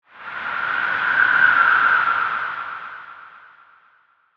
Whoosh Ghost, Horror, Whoosh, Ghost, Pass, By, Howl; Ghosts; BlastWave